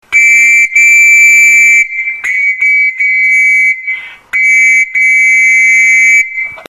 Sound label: scout master whistle